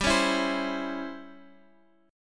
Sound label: C sharp Minor Major 7th Flat 5th G